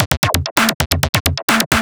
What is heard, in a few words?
131 BPM, Drum Loops; Zero-G; Club Techno; Drum Set